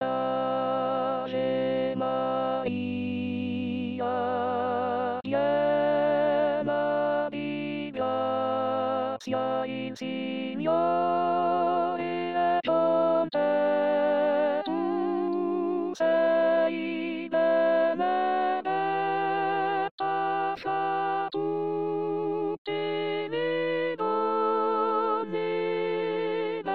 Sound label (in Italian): Ave Maria Contralto